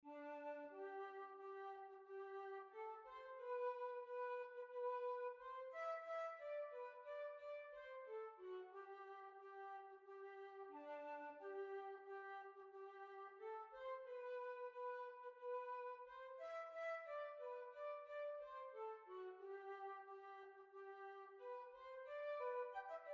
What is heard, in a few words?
Swedish Masquerade